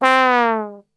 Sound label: C 2 TRB, Brass Super Section; Best Service; 90 s Sample CDs